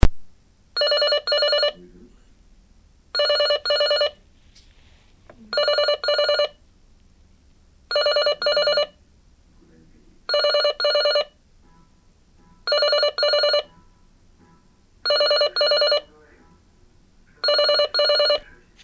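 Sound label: zvuki domofona, intercom sounds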